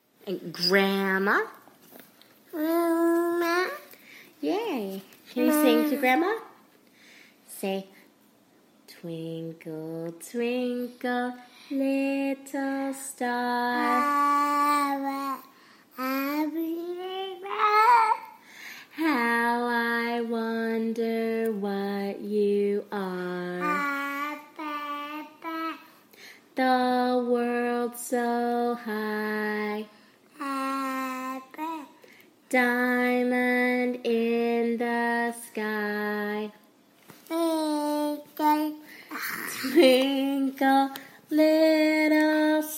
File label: fifteen month old singing Twinkle Twinkle Little Star with mommy